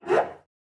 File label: Cello Psycho Screech; SoundsCrate